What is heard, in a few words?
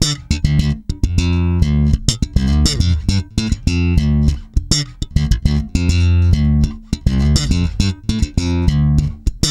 D, hard funk, bass; Spectrasonics; Bass Legends